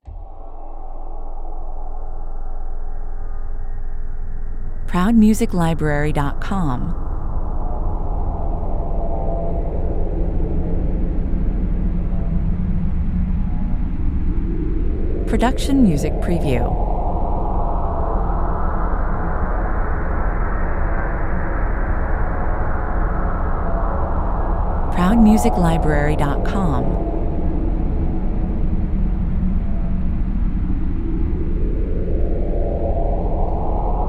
alien winds 117 bpm

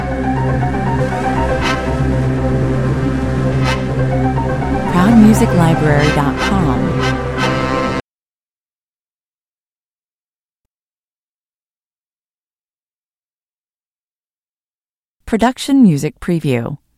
synth loop

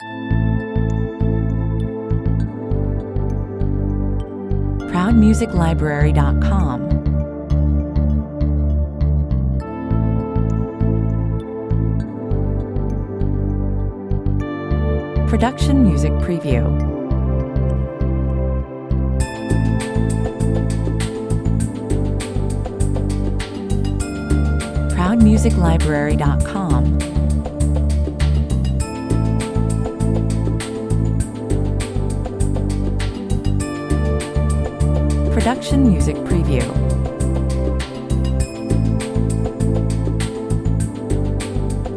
drum and bass 100 bpm

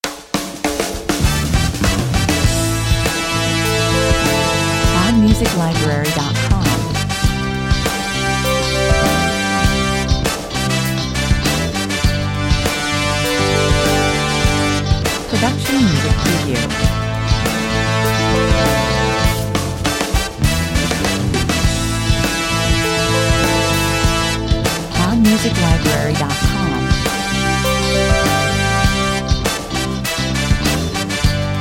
brass jingle, 100 bpm

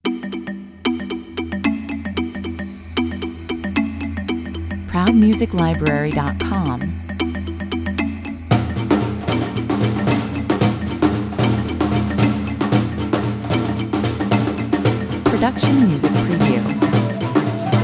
wild flute jig, 114 bpm

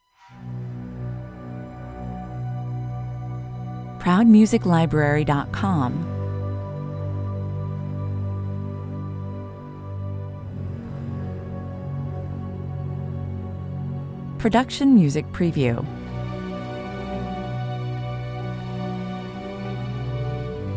dramatic struggle 127 bpm

